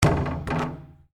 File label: Basketball Rim, Sports, Bounce; Blast Wave